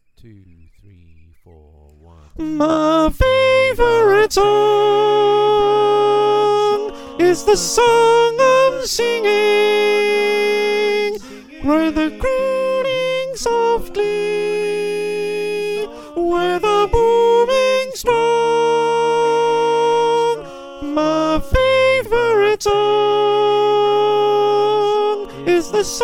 Soprano, choir

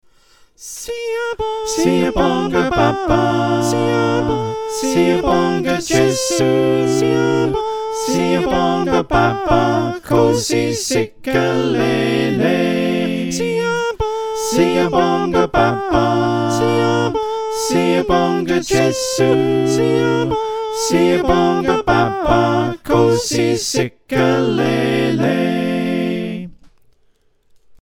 SATB

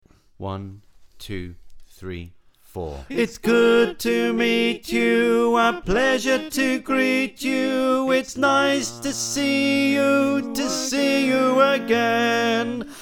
Tenor, choir

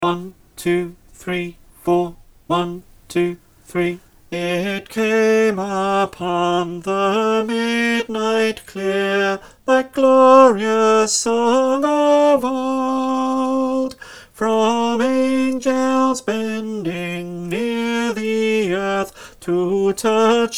unison, choir

